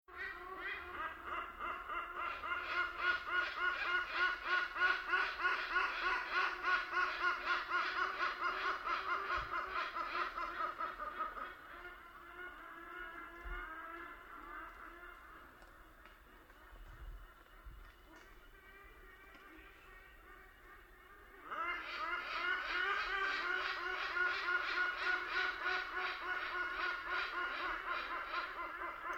Nature Jungle Rainforest Africa Hornbills Group Call Cicadas Nature Kenya; Bird Sound Effects